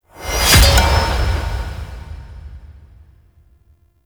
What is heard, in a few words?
Shepherding Sheep Found; Warframe; Duviri